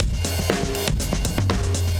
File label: Beat Style, 120bpm, breaks